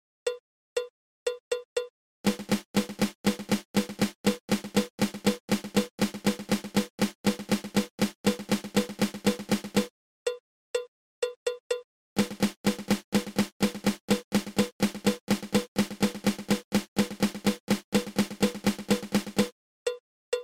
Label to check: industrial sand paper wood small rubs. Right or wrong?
Wrong